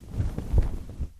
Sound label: Cloth Rustle Light